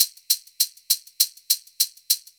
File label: SHAK, FUNK; Hip-Hop & R&B; USB Soundscan vol.47; AKAI